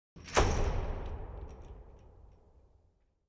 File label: Big door closed; Clemens F